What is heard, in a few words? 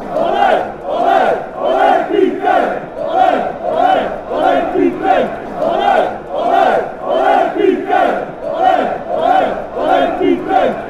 ole, fanchants; pique